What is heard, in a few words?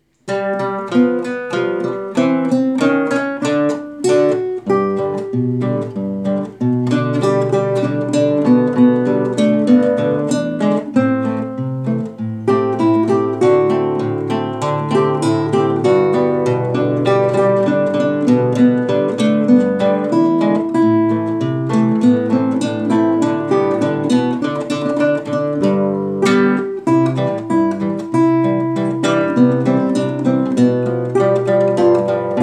Marcha, guitar